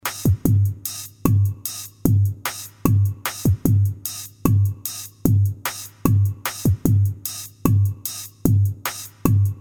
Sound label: Quirky Rhythm